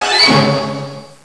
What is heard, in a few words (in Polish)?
ENERGIA